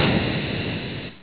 M SHOT

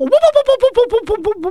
WOO BOOB

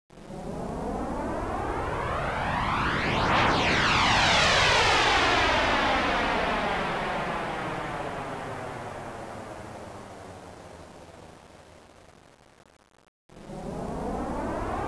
FLIGHT

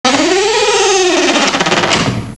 DOOR CLOS

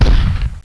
FLAK; THEXDER

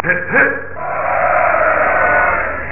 hip hip; dot2dot

